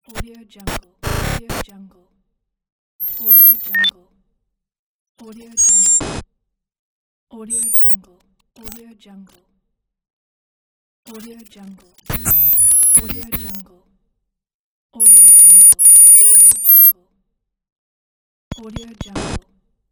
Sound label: digital glitch